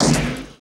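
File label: laser gun; Total Control - Action Cutz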